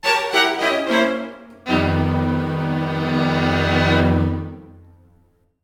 shock; Town of Night

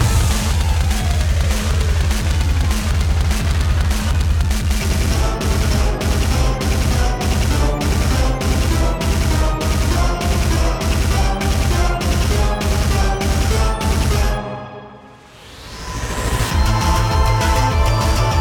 Main Loop; Skeletal Guy

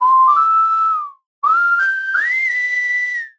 whistle

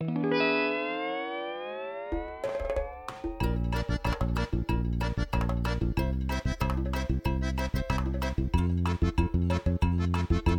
intro music; krabs

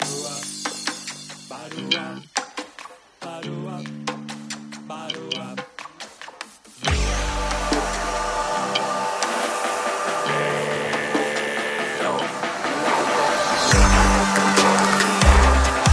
Air Beat

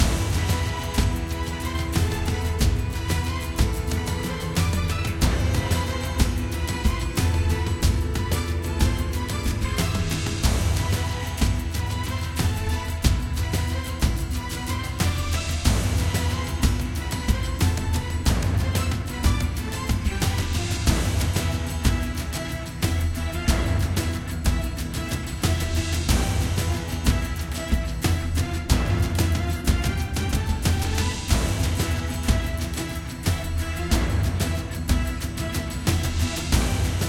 Combat High Loop; assassin s creed chronicles india

